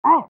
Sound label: pain sharp; pyro; Garry's Mod; tf2 content